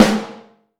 AHB, Vintage Snare Drum; Q Up Arts; Johnny.C's Vintage Snare Drum; 5.5X14 SLR

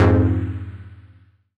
Fm Dub Chord

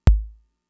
Kick, Drum Machines Fricke MFB-522

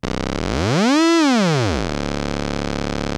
ARP 2600 C2, synthesizer; Hollywood Edge - Giorgio Moroder Rare Synthesizer Collection

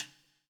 POWER RIM

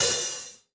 Gretsch Hi-Hat Open; 1967 Gretsch Kit; One-Shots; Sonic Foundry (Sony Creative Software); Vital Drums

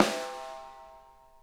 SNARE EDG; Sonic Reality; Interactive Drum Kits

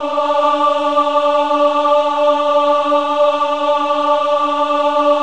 VIB D4, VIBRATO, Voices; Spectrasonics

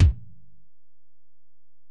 Ac BD, Alesis DM-PRO; 300 Drum Machines

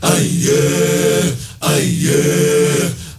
MALE, HUMMERS

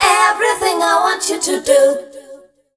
FEMALE CHOIR, Dance Vocals

